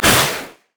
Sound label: teleport; LoL; diana